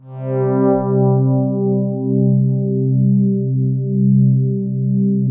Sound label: 5th soft C2; SPACIOUS; Music Store - Claudius Diemeter Schwerlos; SPACIOUS 1